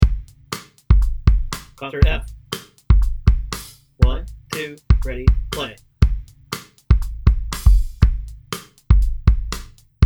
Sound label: scale drill at 120bpm